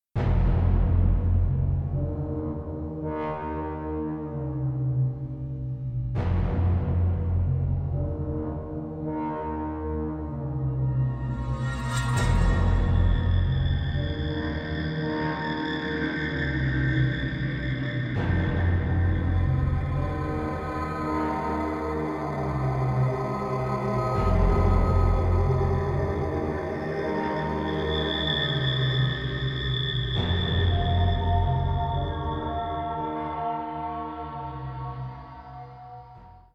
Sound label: subway suspense; F.E.A.R. 2: Project Origin